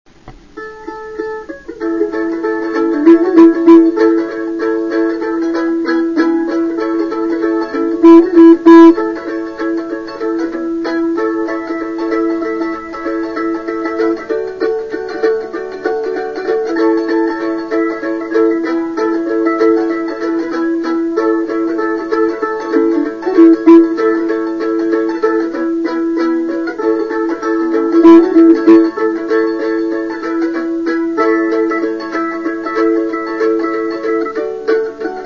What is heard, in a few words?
duet